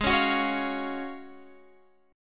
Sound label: Gb Major 7th Suspended 2nd